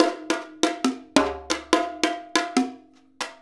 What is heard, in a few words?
Bongo With Stick; Drums; Bongos; Essential Percussion